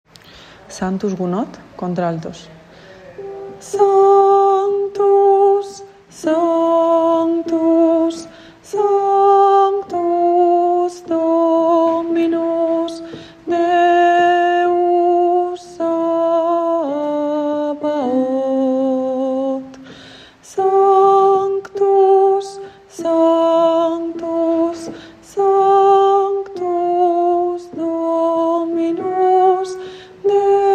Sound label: sanctus alt